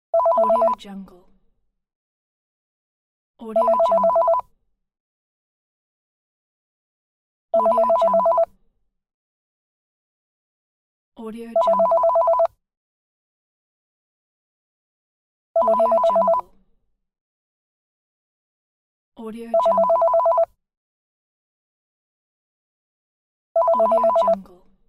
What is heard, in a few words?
telephone pack